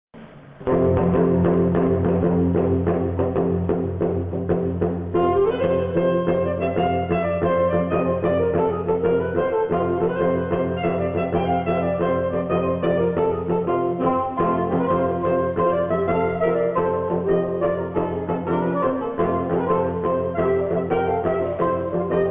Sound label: sax